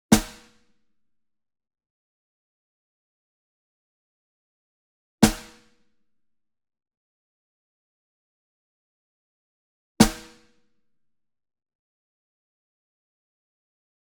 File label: SINGLE SNARE Brady Jarrah Block Hit Near; boom library; Cinematic Strikes Construction Kit